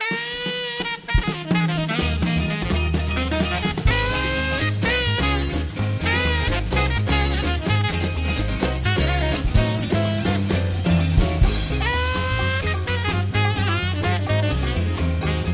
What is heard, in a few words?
Dancehall Theme, Short Light Sax